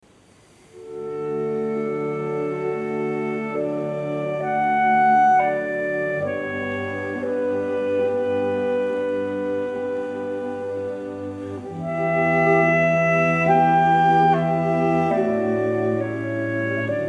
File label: Clarinet Quinet in A Major, Larghetto; Mozart; K581